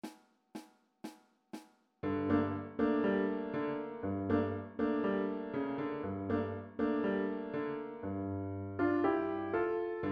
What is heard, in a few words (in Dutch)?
Freylechs zonder klarinet snel; Freylechs from Warsaw